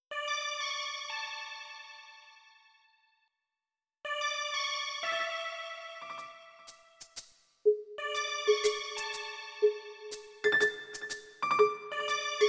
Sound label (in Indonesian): Nada Dering Nokia Jadul; Sunday Walk